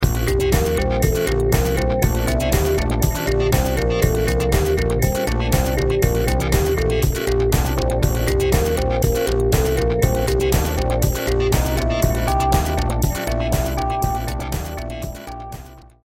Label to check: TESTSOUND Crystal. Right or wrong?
Right